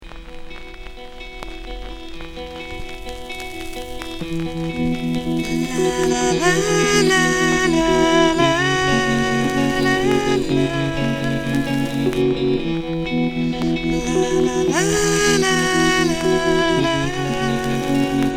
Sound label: Under Water, male vocal